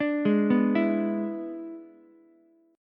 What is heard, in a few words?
E 7th E down Ukulele; E7 arpeggiated; JGuitar